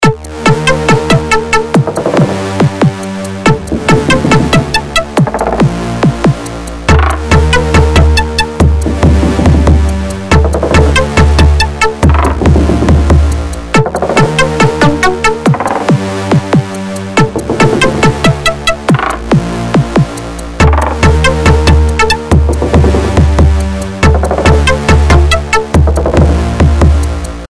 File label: bgmusic